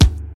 trebly bass drum sample d key, kick drum sample tuned to the D note